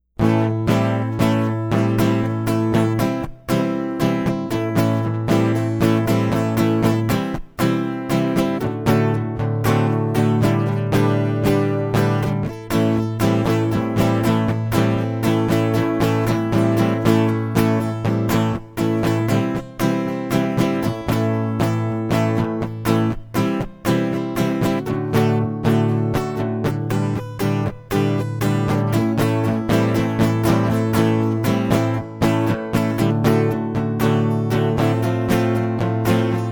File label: acoustic